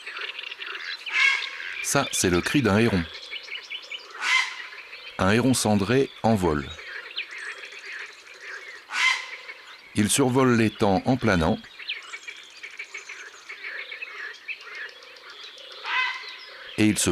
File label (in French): Heron cendre; ABC de la nature